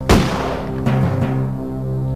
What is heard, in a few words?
death; singe